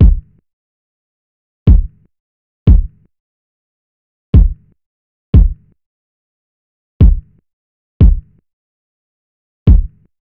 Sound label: Drum Loop, 90 BPM Kick, Boom Bap; Loop Stems; Cymatics; GHOST (Beta Pack); Armed Drum Loop